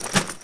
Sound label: Webbing; Player; Footsteps